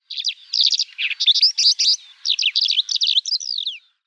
Weissfluegellerche Melanocorypha leucoptera, Vogelstimmen

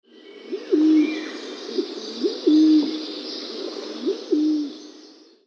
Lorbeertaube Columba junoniae

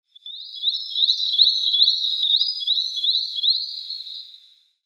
Drosseluferlaeufer Actitis macularia; Vogelstimmen

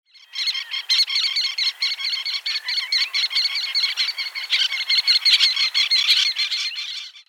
Zwergseeschwalbe Sterna albifrons; Vogelstimmen

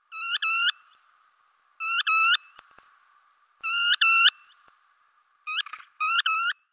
Bairdstrandlaeufer Calidris bairdii; Vogelstimmen

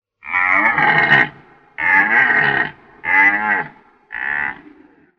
Rothirsch Cervus elaphus elaphus; Tierstimmen

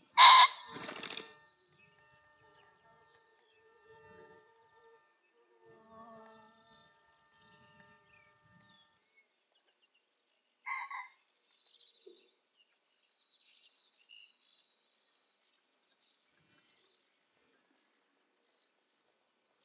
Fasan Phasianus colchicus, Weinviertel; Vogelstimmen

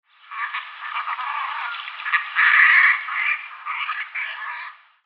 Scheckente Polysticta stelleri; Vogelstimmen

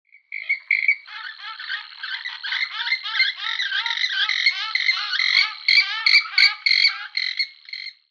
Purpurhuhn Porphyrio porphyrio

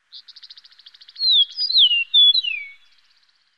Lerchenstaerling Sturnella magna

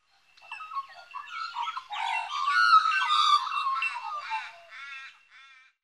Floetenvogel Gymnorhina tibicen; Vogelstimmen

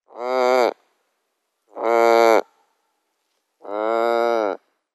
Kaiseradler Aquila heliaca; Vogelstimmen

